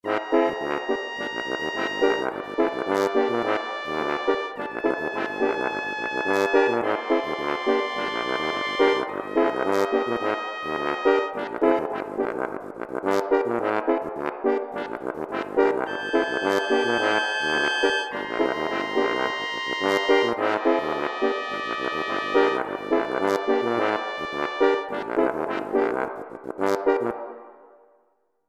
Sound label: Trumpet Song